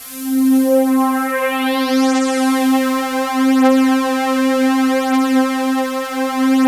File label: XXL SWEEP, BIG SWEEP; Masterbits; Vintage Voltage